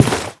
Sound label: player tile